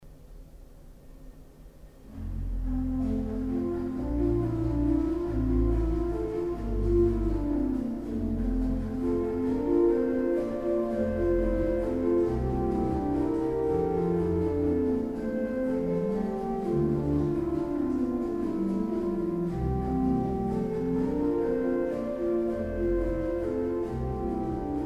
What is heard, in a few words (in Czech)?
varhany; litomerice